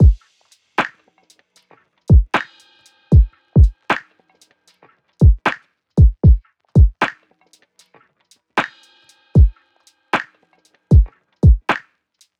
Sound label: Drumloop, 77bpm, Gm